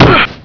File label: fall, male; Digital Paintball 2